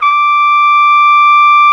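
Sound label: cornet D5 right; Greytsounds VOL-1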